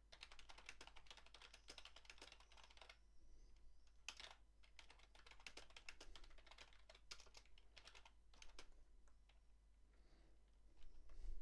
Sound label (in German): nebengeraeusche; HyperX Cloud III